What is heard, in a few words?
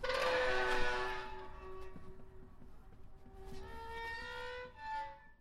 creaking gate